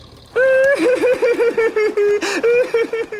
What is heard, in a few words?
crying sound; oggy